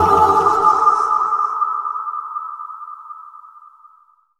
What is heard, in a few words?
Synth Shots; Vengeance Samples